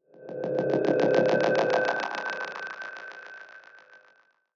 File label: Movement; 99Sounds; InterSpace